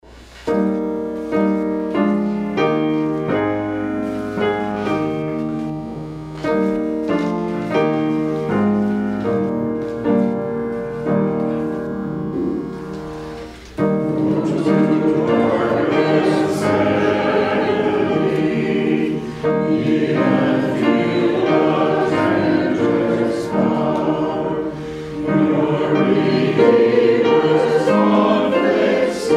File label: hymn; Go to Dark Gethsemane